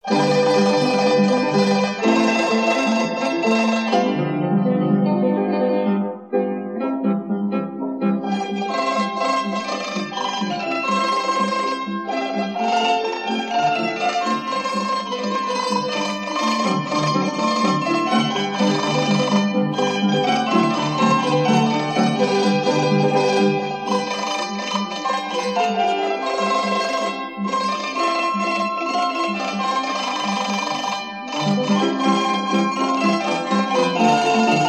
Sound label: band organ; WURLITZER POTPOURRI